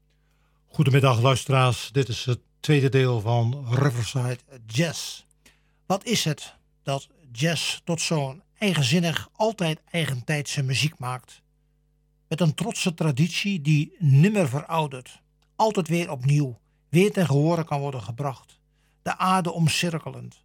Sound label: Jazz